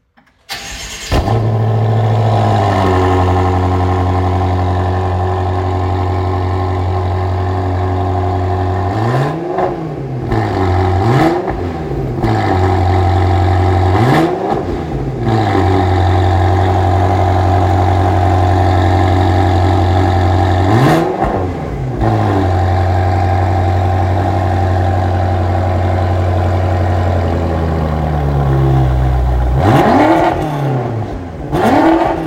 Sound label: bmw m6; bmw m6 gran coupe